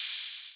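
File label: 808 hi-hat; Wizoo - Platinum 24 Electronic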